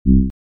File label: fm Bass; FSound